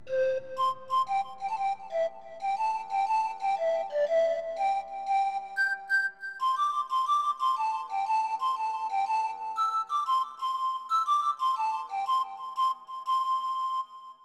Pokemon Flute; Pokemon Snap

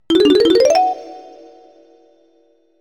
Collect Melon Piece; donkey kong 64

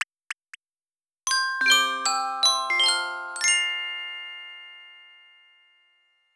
Jingle, Birthday; Nintendo Countdown Calendar - Event (Birthday); Nintendo DSi

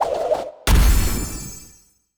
Epic Drop; Animation Throwdown

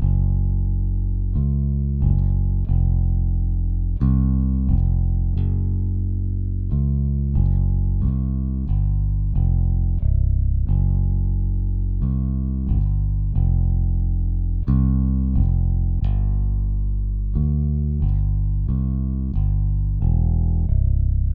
bass; Toki Tori 2+; Toki Tori Collection

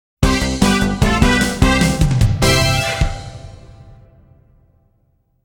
Celebrity Surprise Photo Success; tanjou s saturn version original soundtrack perfect collection

